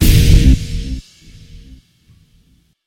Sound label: Guitar Hit Rev